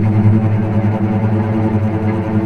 VCS TREMOLO, string; East West - The Ultimate String Collection

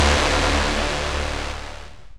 SYN, dance; Sound & Vision - Gigapack II

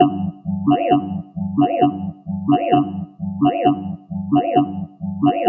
Lead; neuro; InsideInfo

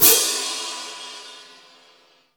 ORCH CYMBAL; CYMBALS 2

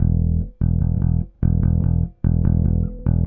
Bass, Indie Rock; Sonic Foundry (Sony Creative Software); Indie Rock Anthems; Session 11 - Silos

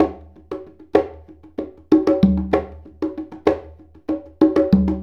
Congas; Essential Percussion

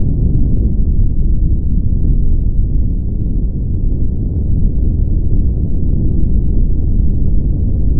Drone Ambient; Drums & Drones

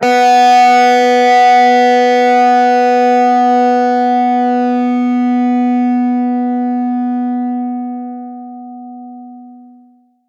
PLK DIST B3, Acoustic and Electric, Plucked; Polyend; Simulacrum Sounds; 1 Shots

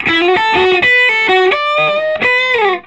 SOLO, Vintage Blues Guitar

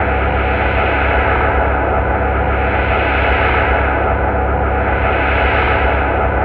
HUMM DRUM; Distored Reality

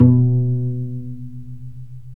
STR VC PIZ, Chamber Pizz, String Master Series

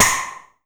BREAT; Masterbits - Total Zone; ADD.PERC.

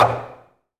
SMALL DRUM, JAPANESE

